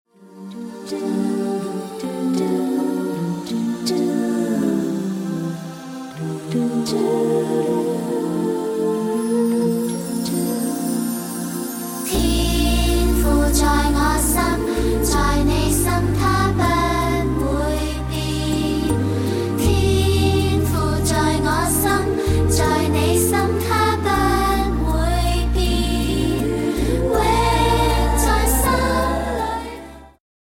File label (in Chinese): Karaoke, 兒童詩歌